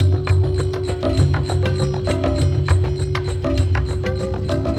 Ambi Groove

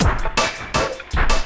LOOP, 162 BPM, Drum & Bass; Ueberschall; Drum & Bass Resonance

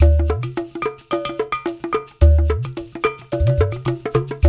UDU, Udu Bata; Spectrasonics; Supreme Beats

